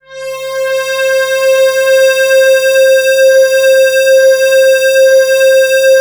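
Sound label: PAD C5 right, Jungle; USB Soundscan vol.05 - Explosive Jungle; AKAI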